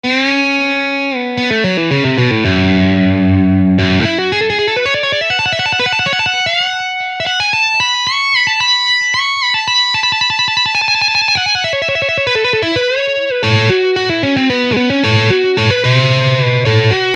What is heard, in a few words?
Lick